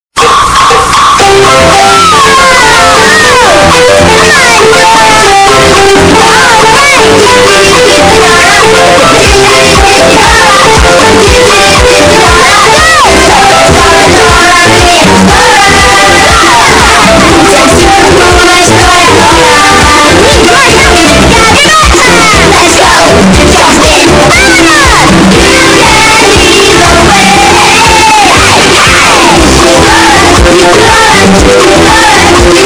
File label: bass boosted; Dora